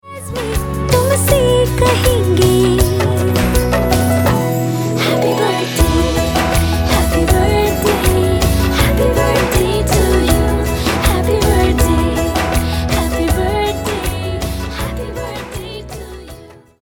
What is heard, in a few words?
HAPPY BIRTHDAY TUNE SPOUSE HINDI FEMALE